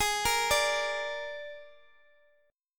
Gsharp Suspended 2nd Flat 5th, Guitar, Half Step Down